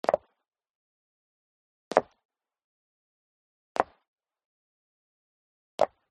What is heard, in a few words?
FOLEY STEP High Heels Concrete Single Stair Up; smartsound